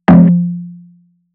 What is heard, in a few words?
Snares, Alesis Performance Pad